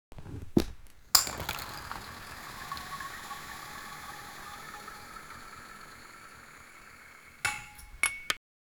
clinica, medical equipment

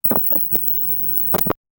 efecto de sonido de cambi, television

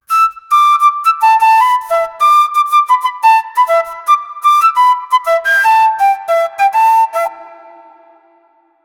la flauta debe desplegar, carnival

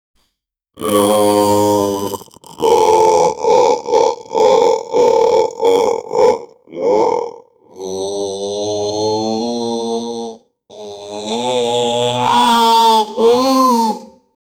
sonido de ondas graves; waves

